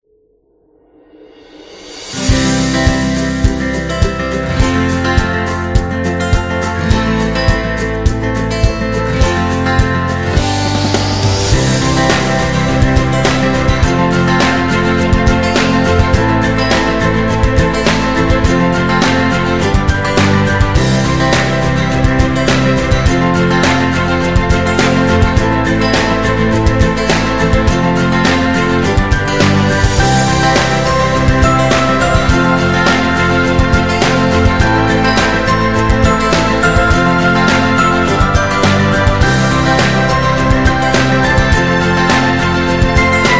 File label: Guitar Happy